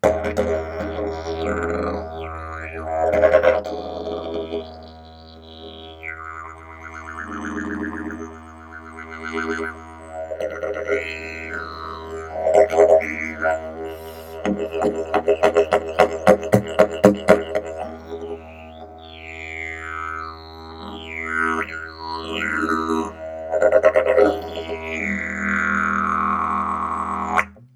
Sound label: didge